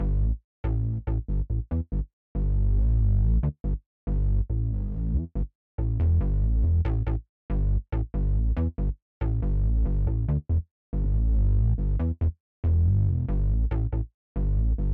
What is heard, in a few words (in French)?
BASSE